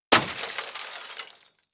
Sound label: Glass breaking